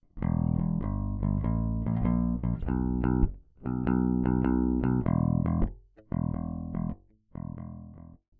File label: bass; apogee jam 96k